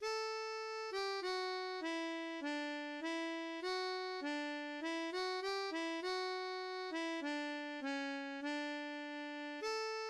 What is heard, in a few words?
Deck the halls, harmonica; Diatonique